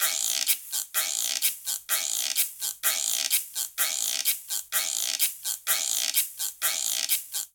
Cha-cha-cha Shaker & Guiro-127bpm; Latin Percussion; Ableton; Factory Packs

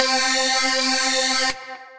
Slightly Singed, Synth Lead; Ableton; Factory Packs; Build and Drop

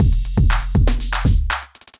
Electrified Kit; Electrified Kits

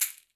Caxixi Open; Latin Percussion; Drums; Drum Hits; Shaker; Ableton; Factory Packs

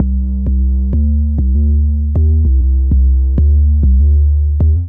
Bending Boom 89bpm, Bassline; MIDI Clips; Ableton; Factory Packs; Chop and Swing

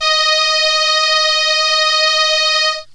Solina Trumpet D#4; Analog Synths; Ableton Foundation FreePacks

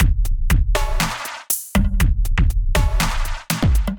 Kit, Drums Hybrid; Elektrafyde Kit; Ableton; Factory Packs; Drum Essentials

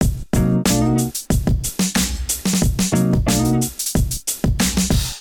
Kit Melodic 92 bpm, Drums; MIDI Clips; Dobbs; Ableton; Chop and Swing

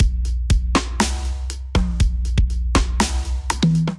Kit, drums acoustic; Cyndal Kit; Ableton; Factory Packs; Drum Essentials

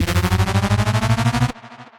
pulse ascend, effects

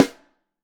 SN3 Top, snare

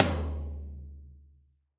Tom Low Reso Classic; drum hits; Ableton; Factory Packs; Session Drums Club; Standard Hits

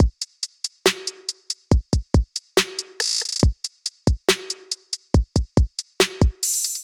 MPE Rhapsody Kit 70 bpm, Trap Drums; Trap Drums by Sound Oracle; Ableton; Factory Packs

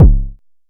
analog attacked, Kickbank; Ableton Foundation FreePacks

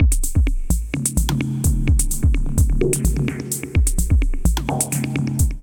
Pads Dub Techno Kit groove 128 bpm, Drum; MIDI Clips; 111 - 130 bpm; 64 Pads Dub Techno Kit groove; Ableton; Factory Packs; Drum Essentials

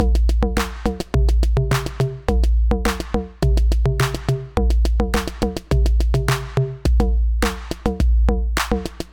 808 105 Beat, Drum Machines; Linegraf; Ableton

